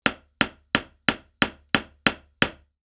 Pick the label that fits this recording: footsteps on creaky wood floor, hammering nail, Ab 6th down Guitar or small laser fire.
hammering nail